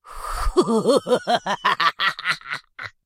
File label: laugh